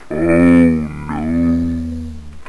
error

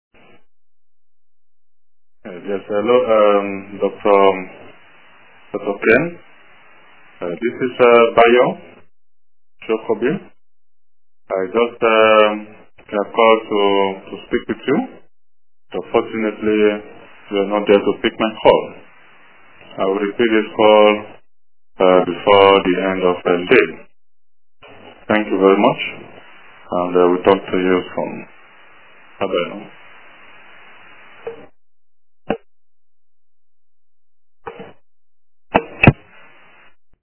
Yawn

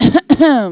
ahem

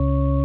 c sharp 3, music keyboard

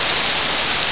rain

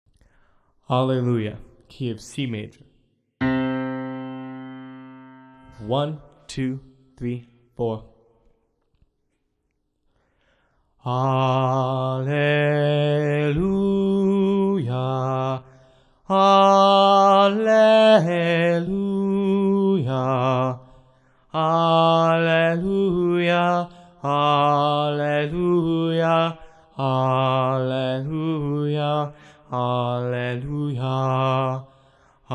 all bass

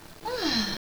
ahhh

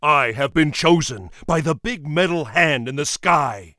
Metal Hand; Warcraft

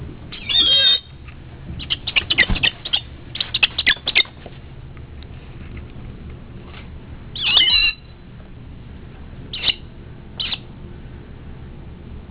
Broad Winged Hawk

